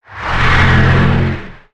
Stun Scream; creatures organic

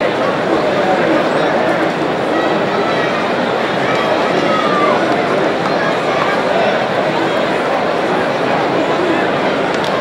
Small Audience Idle

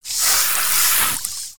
Pet Cat Spray; creatures pets

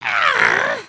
disappointed

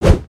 Sword Slash; aronwk collection; ndaudio

